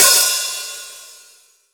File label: T HH, STUDIO HH